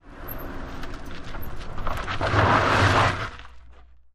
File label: Car Tires Gritty Ski, tires on dirt